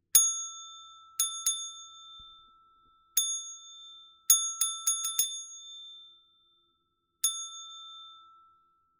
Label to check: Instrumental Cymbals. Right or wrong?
Wrong